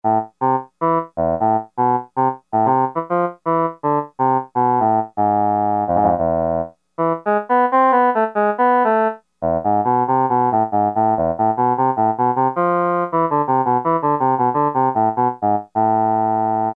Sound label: English Horn, Roland Juno-60; Factory presets